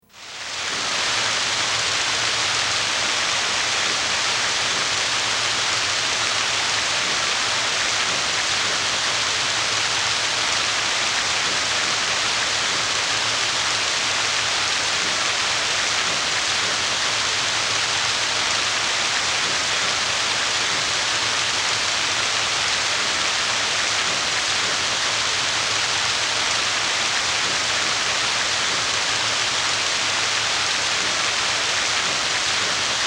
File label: Rain in Thorn Tail Hollow Ambience